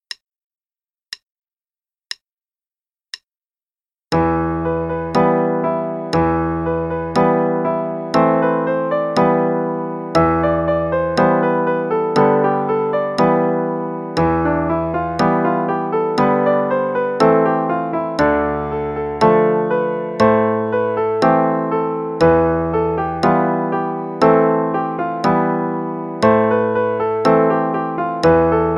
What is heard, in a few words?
Play-along Ey Iran, Persian Music; qn=60, 1st page only